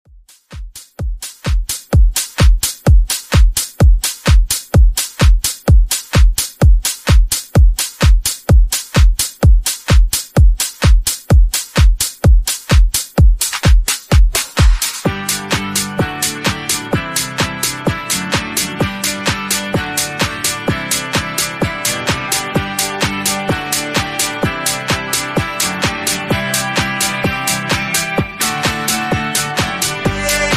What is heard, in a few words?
128BPM